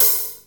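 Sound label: open hi hat; jazz jackrabbit 2; M8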